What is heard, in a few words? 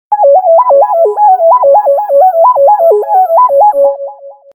Wobble, ringtones; Sony Ericsson W380i